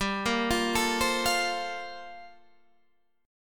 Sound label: G Minor 11th Guitar Standard, Gm11 chord; arpeggiated; JGuitar